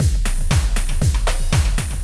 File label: Red Drum; Cloudz 1.0.3